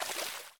small splash; factorio 2.0.55

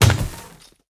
car tree wood impact; factorio 2.0.55

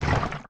fluid inventory move; factorio 2.0.55

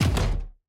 mechanical large inventory move; factorio 2.0.55

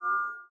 lamp activate; factorio 2.0.55

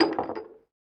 science inventory move; factorio 2.0.55

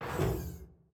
gate close; factorio 2.0.55

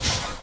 steam inventory move; factorio 2.0.55

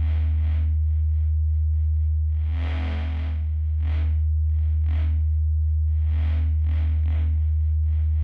substation; factorio 2.0.55

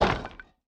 concrete inventory move; factorio 2.0.55

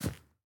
grass, walking; factorio 2.0.55

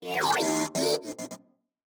construction robot; factorio 2.0.55

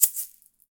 speaker inventory pickup; factorio 2.0.55

